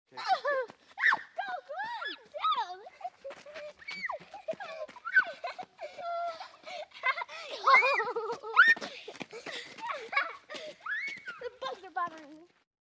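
two children running after each other and laughing; Звук играющих на улице детей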